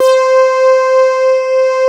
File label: MOOG, Analog; USB Soundscan vol.49; Analog Fantasies; AKAI